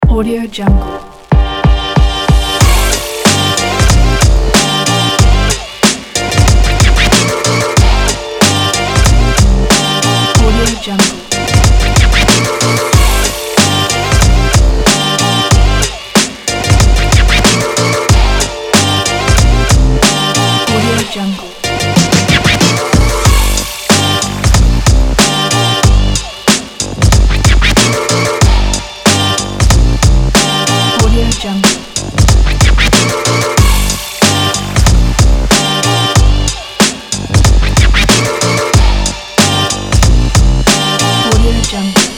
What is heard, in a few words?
fashion vlog urban sport